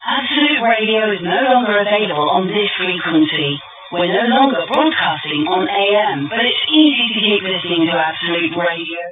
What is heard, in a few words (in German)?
1215 kHz, AM-Rundfunksender; Absolute Radio